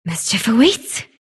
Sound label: sylph move pain; dota; dark willow